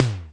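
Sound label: electronic tom low, Kawai R50 drum machine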